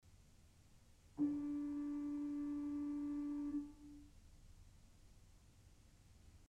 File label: Principal 4' D, orgel pipes